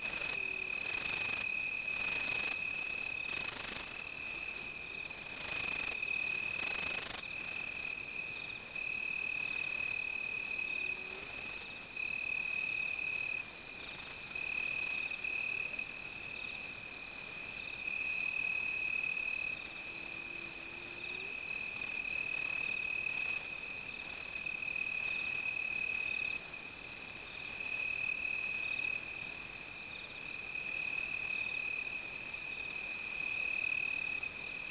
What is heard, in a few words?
ambience; Street Hoops; XBOX